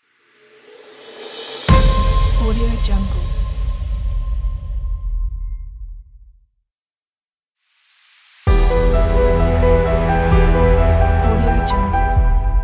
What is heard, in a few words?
logo piano lifter; travel pack